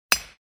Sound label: hammering